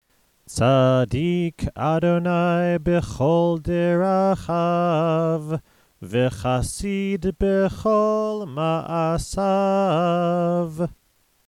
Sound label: ashrei